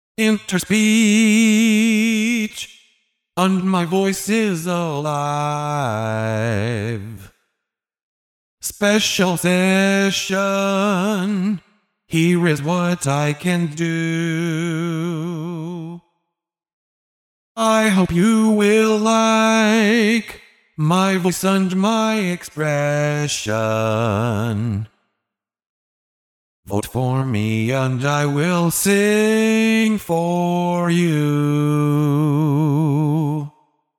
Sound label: solo, singing synthesis; winner of the singing synthesis challenge 2016; interspeech